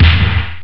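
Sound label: sp12 snare; jazz jackrabbit 1; M8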